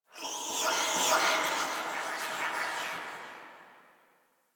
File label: Spirit Chatter, Ambience